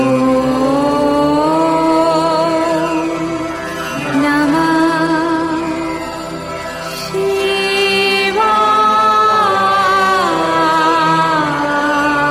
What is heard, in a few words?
aum namah shivaya mantra slow version female